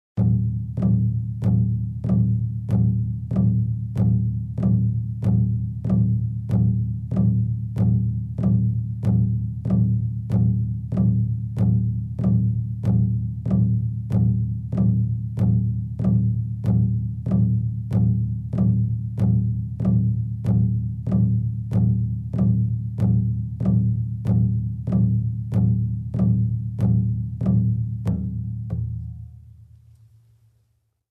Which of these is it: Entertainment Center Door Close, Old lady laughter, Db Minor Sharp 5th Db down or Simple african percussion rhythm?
Simple african percussion rhythm